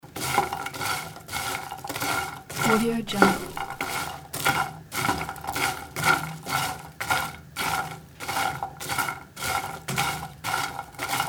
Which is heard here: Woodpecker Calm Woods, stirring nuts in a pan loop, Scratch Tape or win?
stirring nuts in a pan loop